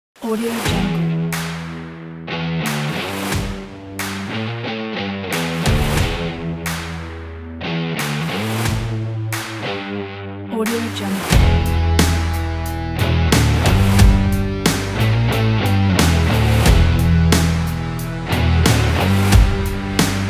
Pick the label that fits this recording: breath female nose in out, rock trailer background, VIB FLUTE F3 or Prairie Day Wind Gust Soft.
rock trailer background